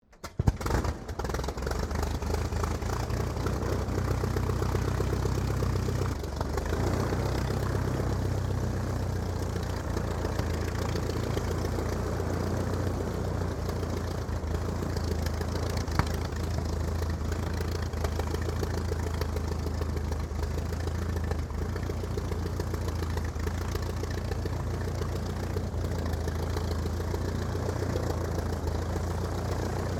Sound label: 1936 DH82A DEHAVILAND TIGERMOTH STARTUP; Airplanes; Vehicles